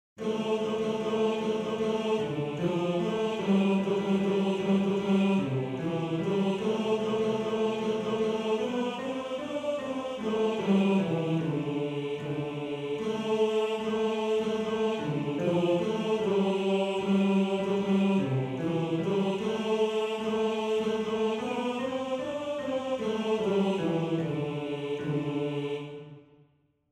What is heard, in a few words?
Tenor; Tenor 1